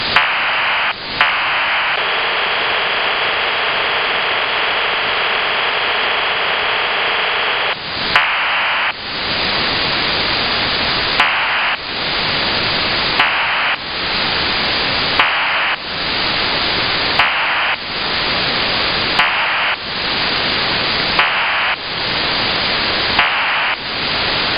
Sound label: CIS OFDM-121 unknown PSK4-like burst repetition